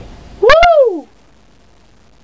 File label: wooow